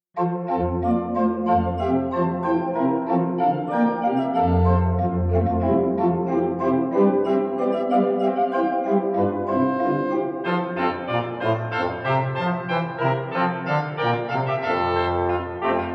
Bourree, Organ; Covenant Presbyterian, LB; Handel, G. F. (arr. Deutsch); Water Music - Bourree

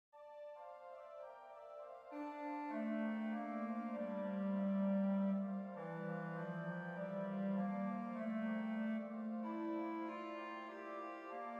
Andante, Organ Recordings; Expanded Schantz; Schroeder, Hermann; Praamblen und Interludien: 3 Andante

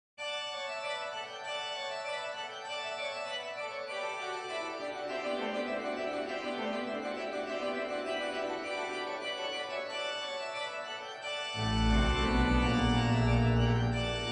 Organ Recordings; Expanded Schantz; Schroeder, Hermann; Praamblen und Interludien: 4 Vivace

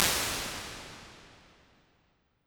VOCAL PLATE; Mark Williams

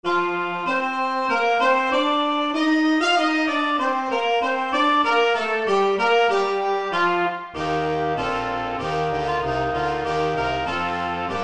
Processional, Brass Quintet

